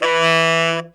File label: MULTIPHNC, TENOR SAX